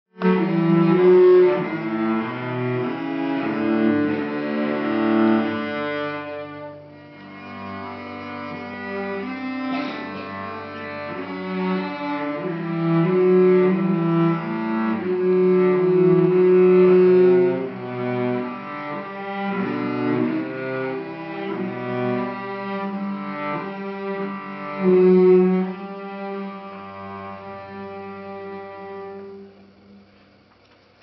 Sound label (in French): violoncelle